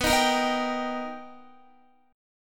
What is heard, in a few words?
B Augmented Major 7th B Guitar, chord; B+M7; strummed; JGuitar